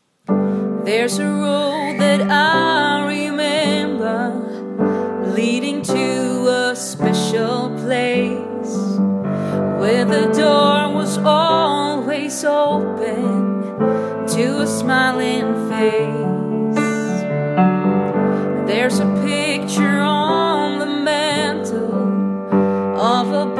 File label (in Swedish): melodi